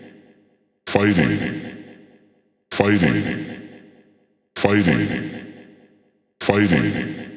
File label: Vocal Loop 130, Techno